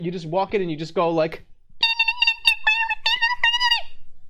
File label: teacher noise; jerma